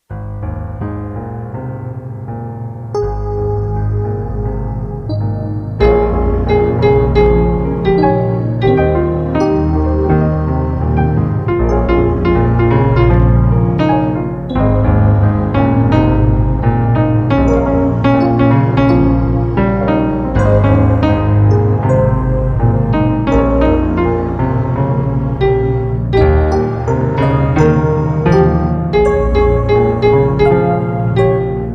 Summer's Serenade Accompaniment with melody in A Flat, piano